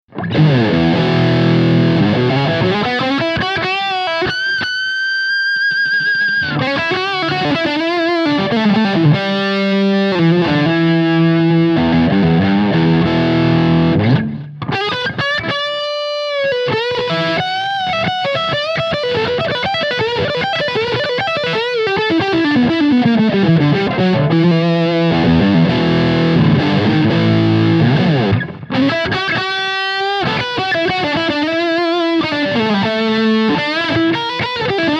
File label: double lead